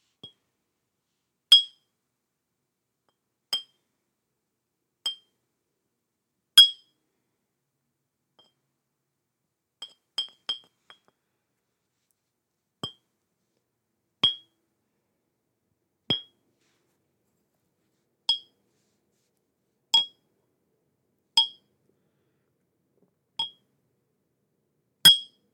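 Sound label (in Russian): удар деревянной палкой по стеклянной бутылке; starbucks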